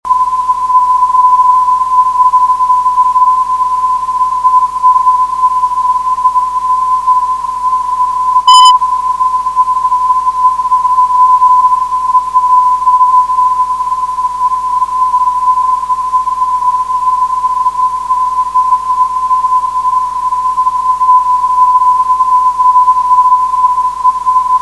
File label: 373khz, ndb